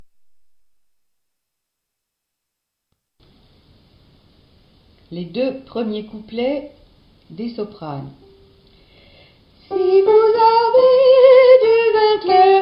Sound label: soprani; La part à Dieu - Chanson traditionnelle de quête pour l'Epiphanie